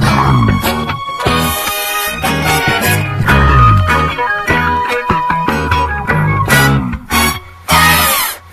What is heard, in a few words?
horns, phonky loops